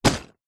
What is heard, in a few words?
office pencil case full drop